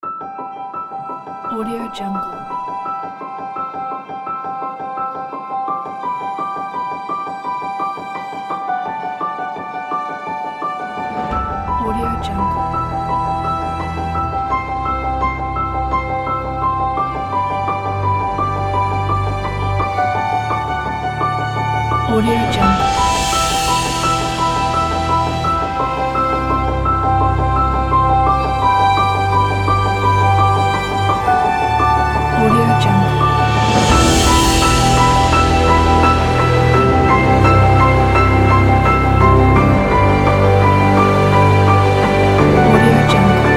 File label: soundscape orchestra; audio-ninja